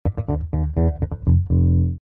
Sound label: Bb 120 Funk Rock